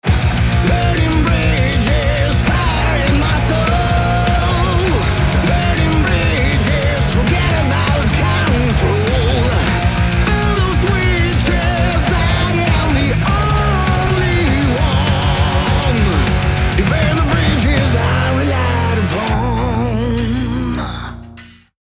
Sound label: Sample Metal Mastered